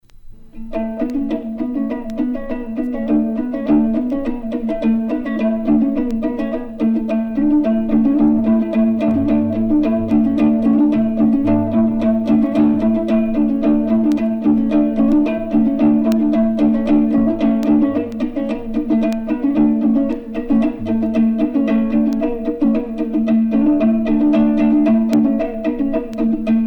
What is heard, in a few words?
tibesti keleli, tchad; asilim